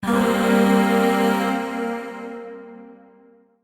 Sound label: Shawzin Blues Chord; Warframe; Zariman Void Shawzin